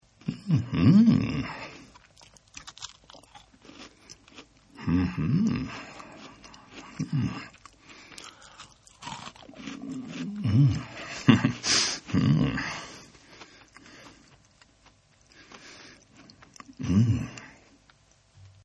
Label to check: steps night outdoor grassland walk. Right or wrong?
Wrong